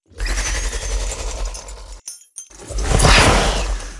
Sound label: Enemy Zmey idle